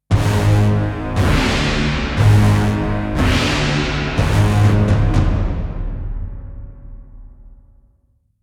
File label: Epic Orchestral Stinger